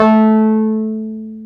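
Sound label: piano, Wurly, Keys of the 60s and 70s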